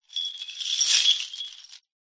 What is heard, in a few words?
industrial chain set down ground